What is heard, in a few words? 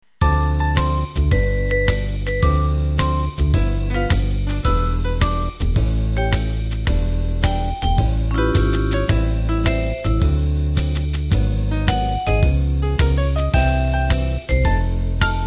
Cool Jazz; Bass, Acoustic Piano, Nylon Guitar, Vibes or Strings and Brushed Drums